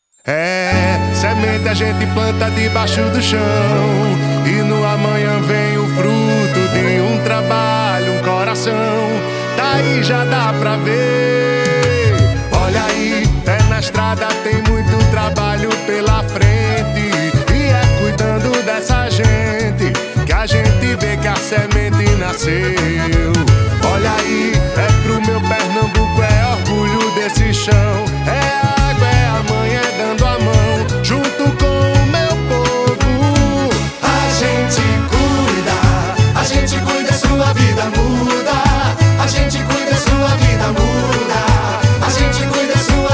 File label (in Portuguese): Jingle, Maracatu; Gov de Pernambuco; Rádio & Áudio